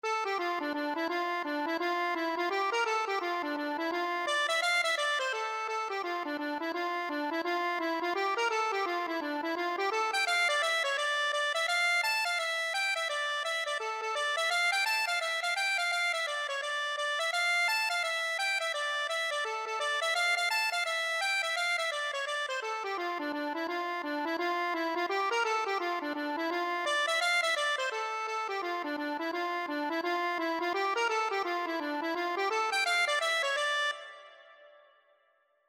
accordion

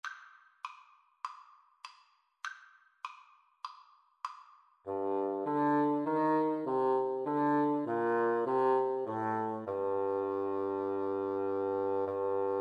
bassoon duet; submarine warfare

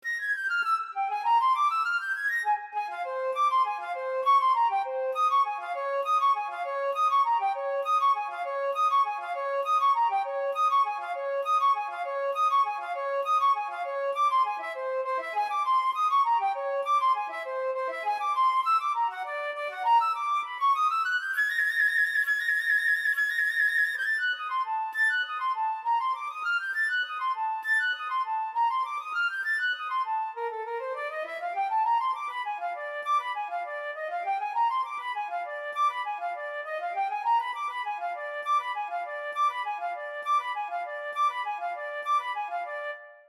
sorcerers apprentice, flute

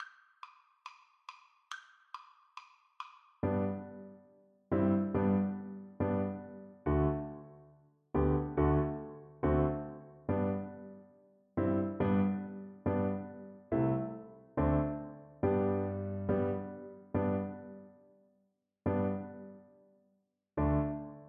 bobby shafto FL kar, Flute

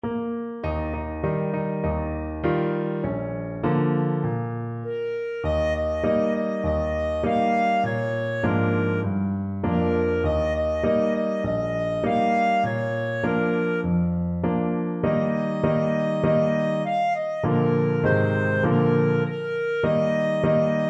kee chee, clarinet

